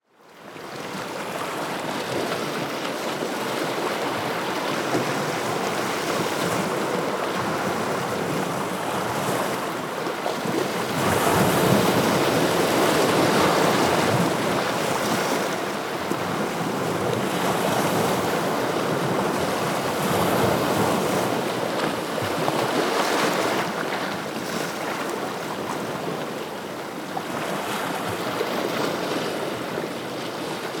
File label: Sea, Mediterranean sea, large waves lapping on rocks; Baia Ostina, Castelsardo, Sardinia